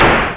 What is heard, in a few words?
explosion; sweetsrunner